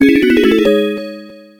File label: Coin; Strider